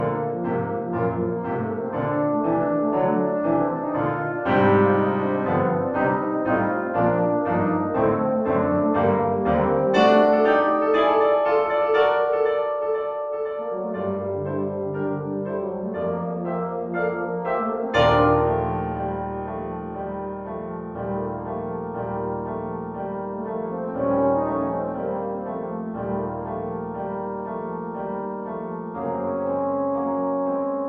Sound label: Prelude and Allegro Piano Reduction